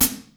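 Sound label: hi-hat rock pedal, Yamaha Motif ES drum machine; 300 Drum Machines; Yamaha Motif ES-Series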